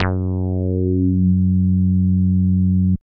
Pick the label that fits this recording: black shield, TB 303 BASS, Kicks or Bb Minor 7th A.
TB 303 BASS